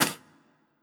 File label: Chicken Spit; Legend of Keepers (gamerip) (2019)